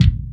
BD hip, Dance Mega Drums; Best Service

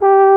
BARITON; C4; Best Service; Alpine Volksmusik

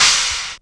Fairlight IIx CMI Pang, Drum Machines

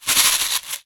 Household Shaker, household rhythm samples Hits

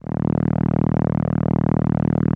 SOLINA, String Machines

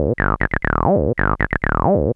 TB-120; X-Static Goldmine 1

